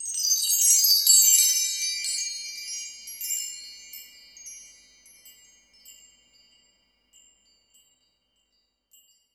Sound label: chimes